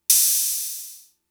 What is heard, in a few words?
Open Hat, Drum Machines MFB-522; Fricke